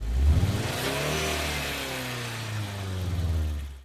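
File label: police engine rev attack; Blind Drive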